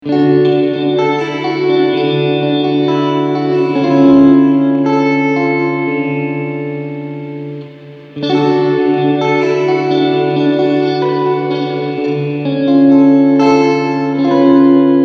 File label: Verb Vibrato